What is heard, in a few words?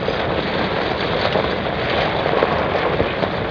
surface gravel loop